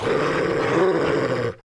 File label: Dog Aggressive